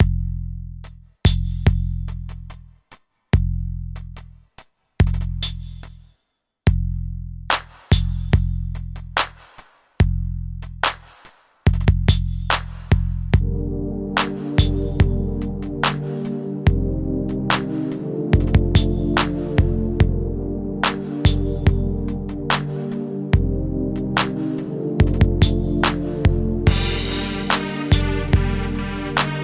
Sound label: Trap